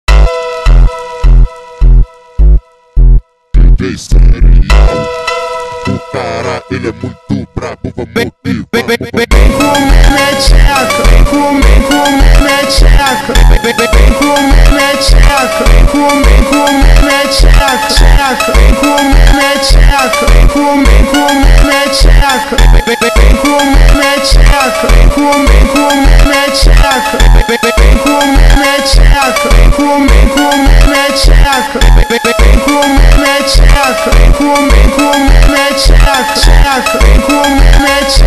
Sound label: slowed